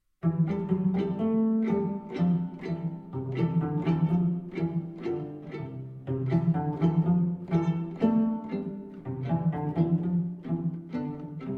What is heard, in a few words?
Pizzicato, Duos